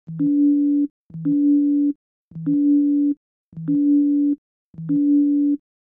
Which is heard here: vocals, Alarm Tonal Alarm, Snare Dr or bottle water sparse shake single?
Alarm Tonal Alarm